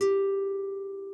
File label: harp